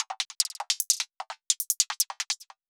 perc modhihat kit; Polyend; Recue; percs glitches and layers

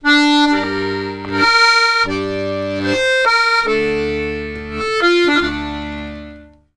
Accordions; USB Soundscan vol.40; AKAI

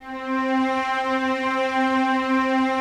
Cellos C 4; Miroslav Vitous; String Ensembles; LINKS ORCH

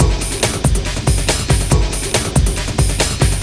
808 Roar at 140; Sonic Foundry (Sony Creative Software); Ugly Remnants VOL-2

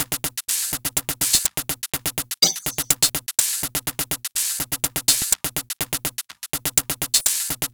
Robot Thunder, Modular Electronica; Beats; Sonic Foundry (Sony Creative Software)

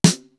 DRY SNARE; East West; Global Sounds

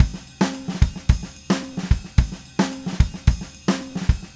Red Maple Groove 110, DW Red Maple Kit

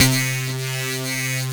SYN, Drum & Bass, 135 BPM; Ueberschall; Drum & Bass Flash Trackz

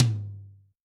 Dry GM3, Tom, Yamaha DTXpress-II

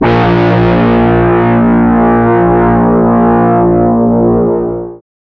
GUI CR A#, E DISTORTION, Metal Mix; Sound & Vision; Gigapack I CD 2

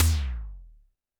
TD-20 Tom B, Roland TD-20 Toms

